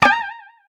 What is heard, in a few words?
Bowling Incorrect Answer